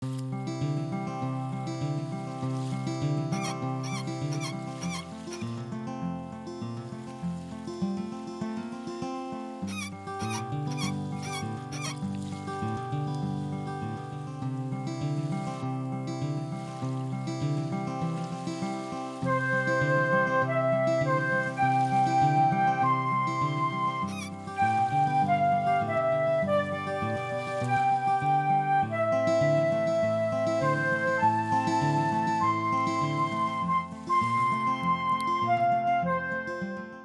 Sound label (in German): Musik und Natur Meer; kompositionen zum thema musik und natur musikkurs